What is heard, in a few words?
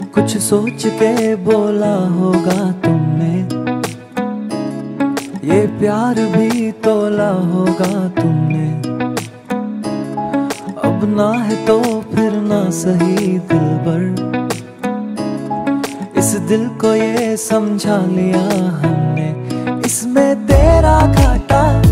Hindi Love Song Ringtone